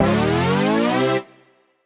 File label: up C, Poly Chord Hits Ramp Up; JPEGG; future rave